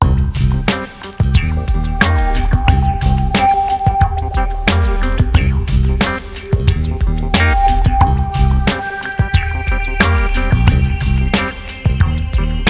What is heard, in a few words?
Funky Science